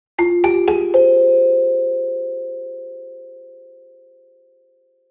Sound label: Marimba; Arc Apellago